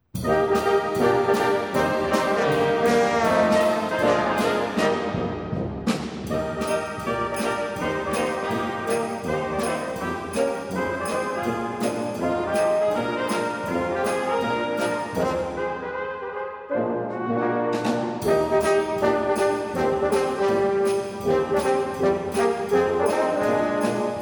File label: brass; xmas